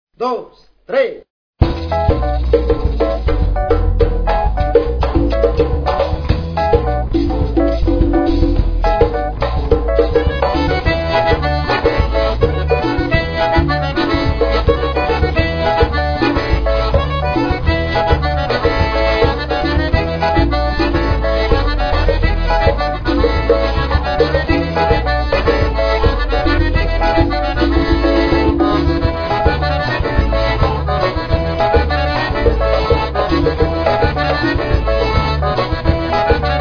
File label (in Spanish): MI NUEVO ACORDEON